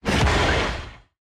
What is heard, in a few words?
thruster mechanic move; platform thruster; Factorio